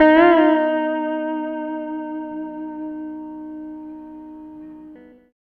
Lap Steel, Trip-Hop Loops; Sonic Foundry (Sony Creative Software); Rondo Brothers Trip-Hop Loops & Samples; Hard Rocks The Roof

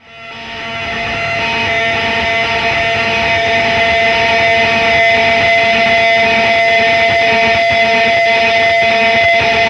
Feedback, Industrial; Nasty

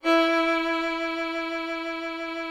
VIOLIN E3; Greytsounds VOL-1

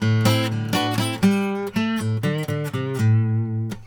Blues Guitar Samples; ILIO; Fretworks; LICK

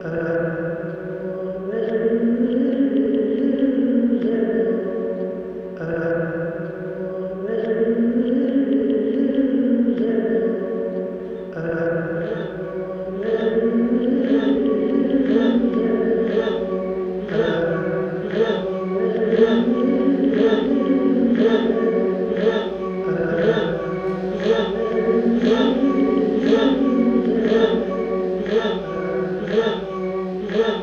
Zammo F#; Zero-G - Ghost In The Machine

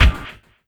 Oneshot

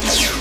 LAZERM; Best Service; Advanced Mega Effects

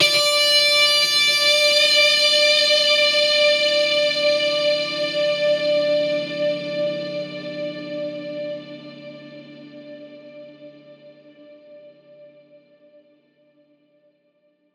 Guitar Notes D; Zero-G - Future Wave

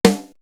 Snare, Drum One Shots

